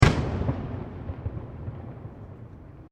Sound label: Fireworks Single Explosion; Backgrounds; Crafted Signals